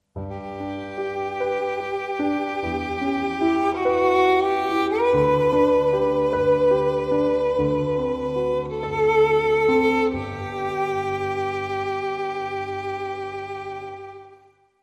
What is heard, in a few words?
Strings